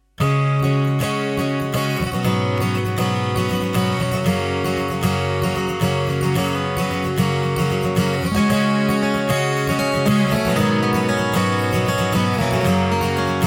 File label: Acoustic